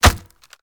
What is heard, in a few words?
Bone Collision; Angry Birds